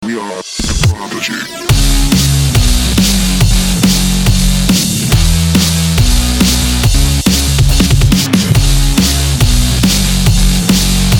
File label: crown song, music